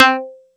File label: Funky Pick c4, Yamaha YX81Z